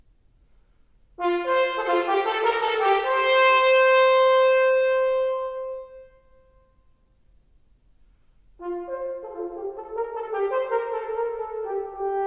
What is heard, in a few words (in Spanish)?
trompa; ecoescuela